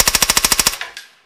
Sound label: silencer burst